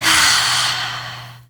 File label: Med Sigh, Daughter, Vocalizations; Warframe; Deimos